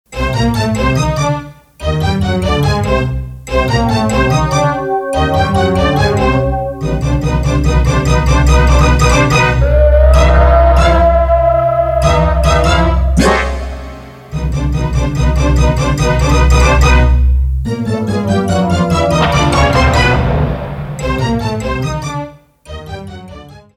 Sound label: Synth Orch Drama